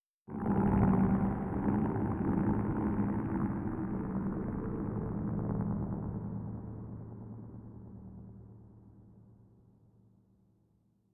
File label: Music Hub Layer erosion disto; Music Hub Layer erosion disto 01